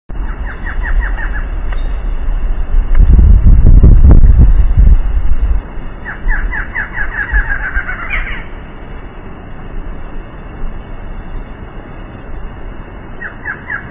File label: Thamnophilus doliatus; Reserva Surucua